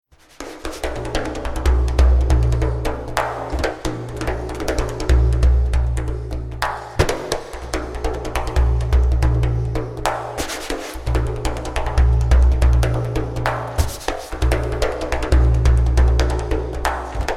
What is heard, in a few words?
Frame Drum